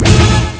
Gong; ST-XX Amiga Soundtracker Sample Packs

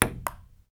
PRC PP, Ping Pong; Rhythm Section; FX Perc

